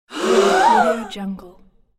small group gasp

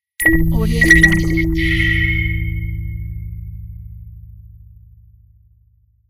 interface warning

